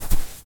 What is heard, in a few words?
Footsteps footstep sand run; SFX; Environment; ProSoundCollection v1 3